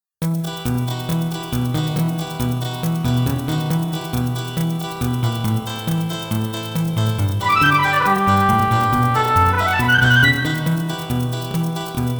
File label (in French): Karaoke en mim haut